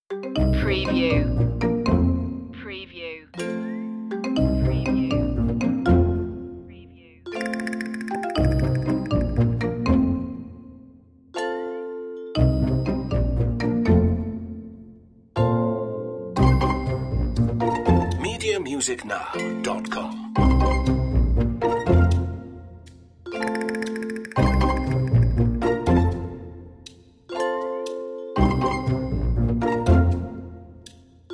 dramedy no perc

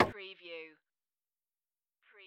Pinball sound, ball hit wall

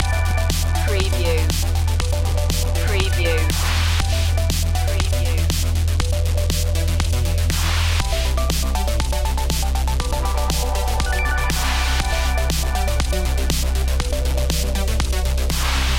synthesis loop

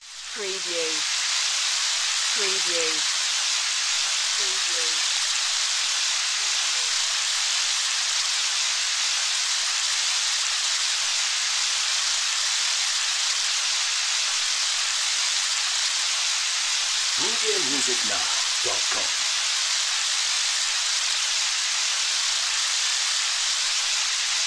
Rain

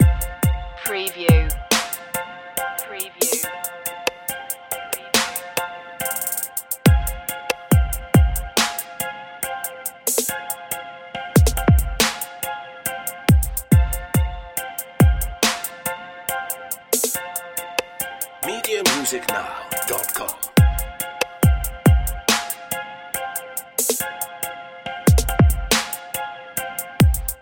your own trap loop

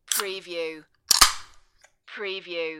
Open Soda Can

